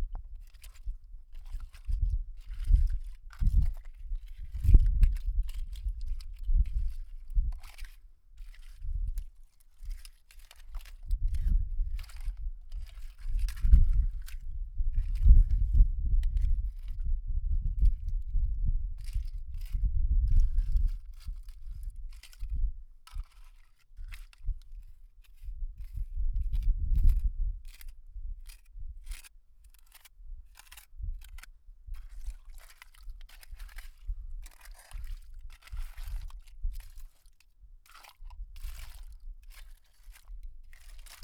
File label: Shells